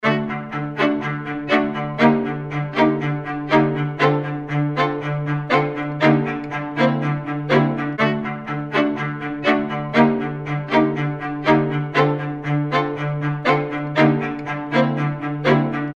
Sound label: Orchestra Strings; Apple GarageBand 2; Apple Loops